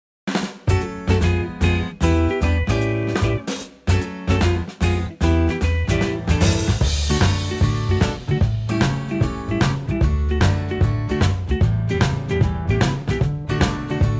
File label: D Eb no flute; key - D to Eb - vocal range - (E) G to B